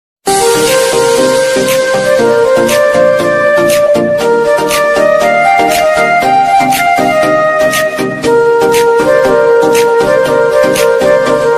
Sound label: rain flute ringtone